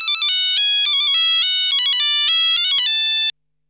remix nokia tune legato, Monophonic; Noa Nakai

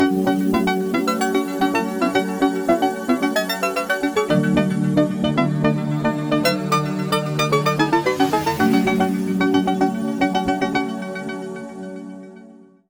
ringtones; Asus ROG Phone 6; NeonFuture

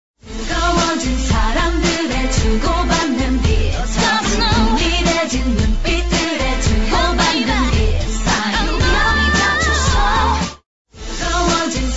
stereo test; Samsung GT-C3590

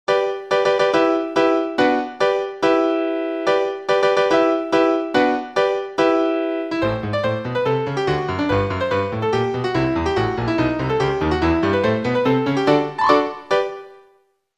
Melody, Ring; Doro Liberto 820 Mini

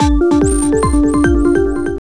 Techno Alarm; Sony CLIE PEG-TG50; HighTech

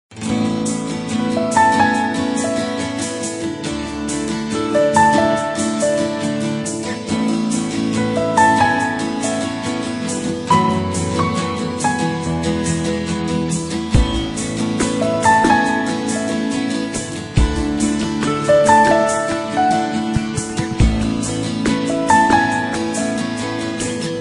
ringtong; Gionee V185

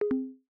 discharging disconnect sound; OnePlus; 9 Pro; ui

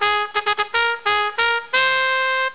fanfare

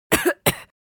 Cough

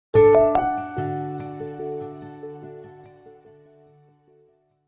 Alarm, Nokia, Digital; Oro

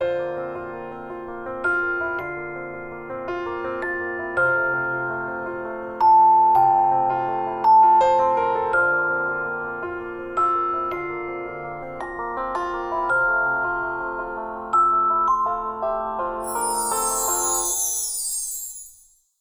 Bell Piano; Samsung SM-W2019

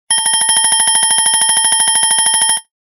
Rapid Alarm Bell; Tecno Spark 40C; alarms

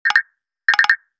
ALERT Woodblock; Nokia

